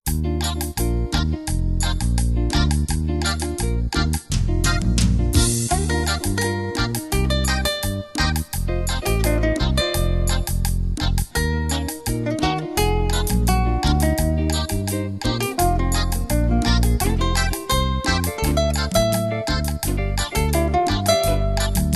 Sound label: cumbia instrumental